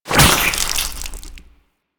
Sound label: Ghoul Devourer Hook Tongue Spit; Warframe; Grineer Ghouls